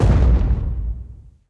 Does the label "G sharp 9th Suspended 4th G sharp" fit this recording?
No